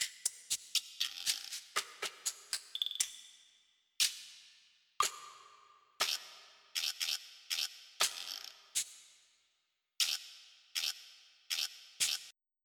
All The Percussn

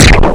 Enemy Missile Launch; Zone Warrior

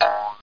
bonce

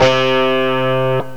charang, synth lead; gmplay

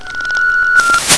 piece gray; Deco 1.0

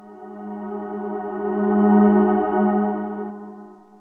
notify; instantmedia; IM

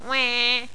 WAAH

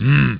MMMM

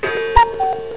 BIG CUKOO

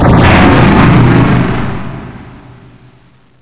warning; Hexen2

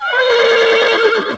horse; ancient

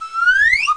Pipe slide up

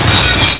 Smash Glass; Casady & Greene; Crystal Crazy Demo; Smash the objects

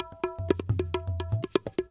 PERC LOOP ETHNO 125

